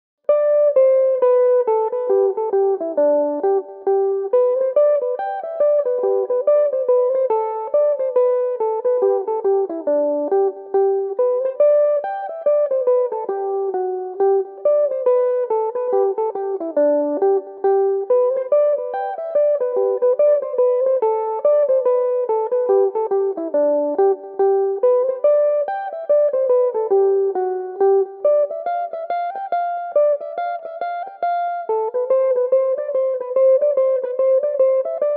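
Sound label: G major, mandolin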